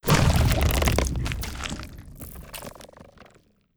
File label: bone rub